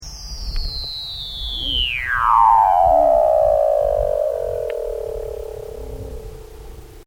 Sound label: weddell ringtone, weddell seal